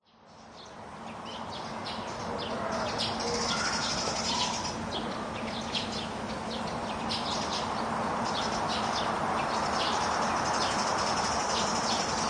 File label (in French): Ambiance placette calme vent oiseaux Binaural